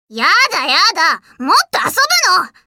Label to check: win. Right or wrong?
Right